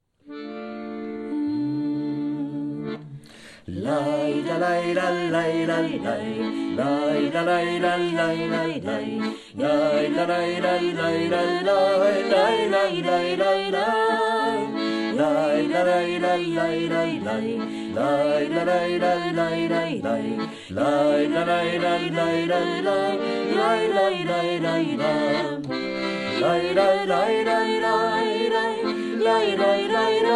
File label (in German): Nigun Frejlach einstimmig